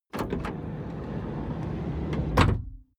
car slide door close

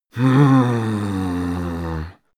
male grumble bored; menschen; koerpergeraeusche; soundpool

